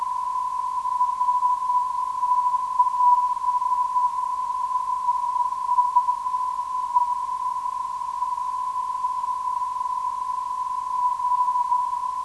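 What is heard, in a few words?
non-directional beacon; RTB; 415kHz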